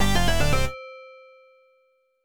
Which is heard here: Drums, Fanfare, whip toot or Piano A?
Fanfare